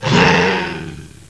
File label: monster snarl; Ferazel's Wand Demo